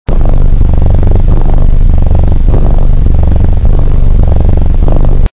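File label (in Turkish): kaplan hırr, hayvanlar; efekt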